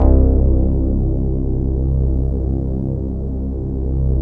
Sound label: SYN, Analog; Sound & Vision; Gigapack I CD 2